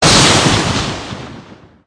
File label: Lightning; nami; battle